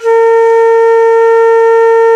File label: VENU A#3, Winds; E-MU Producer Series Vol. 7 – Old World Instruments